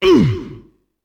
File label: ATTACK; UFC Tapout; XBOX